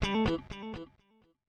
ELECGUIT, HOUSE, 125, A; eJay; Guitar

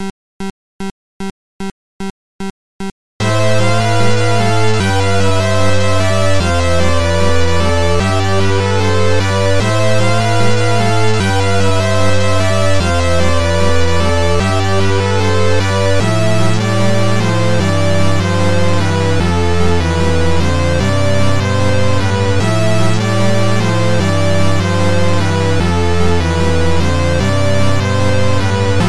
Music; breakingbricks

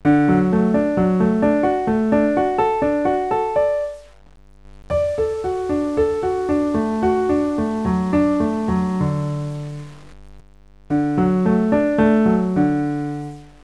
arpegio

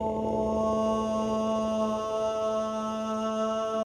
ethno 125; eJay

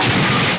SMASH; driver; CD-Gamer 1999-11

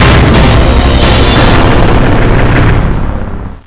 infilt mus

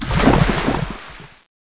SWIM IN

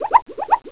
whirl

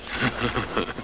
QUACK; judge

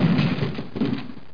ruckus; landslor

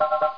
alarm; ventafax

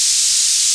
STEAM JET; Shadow

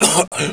choke; SINDEMO; player; blade

